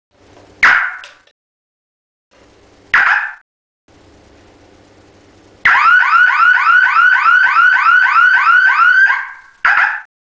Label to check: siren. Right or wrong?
Right